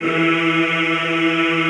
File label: Classical Choir, E2; Best Service; Extended Classical Choir